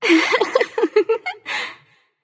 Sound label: laugh light; smite; voicepack; hel